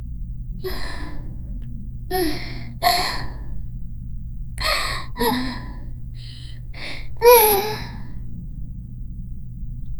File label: a packet of heavy sighs